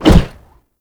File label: Shield Swing; Grineer